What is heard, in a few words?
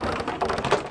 door; allods2d